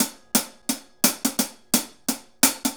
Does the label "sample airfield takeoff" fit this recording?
No